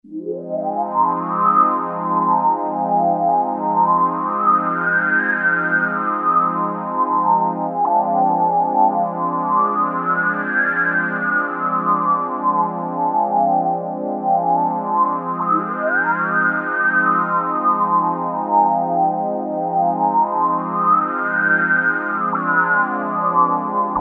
Pad 1 - Hi-Resonance + slow LFO modulation, Crumar Trilogy